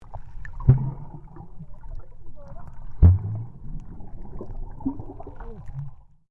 UNDERWATER MOVEMENT IMPACT DOUBLE, Submerged impacts created by dropping a weighted rope; Submerged impacts created by dropping a weighted rope into the ocean; Splashes; Foundation4